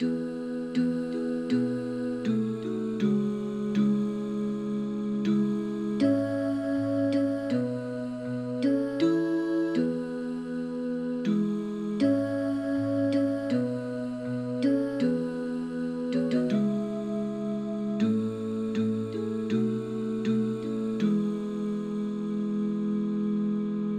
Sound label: Santo tenor